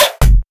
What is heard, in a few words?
PERC woow; M8; percussion and foley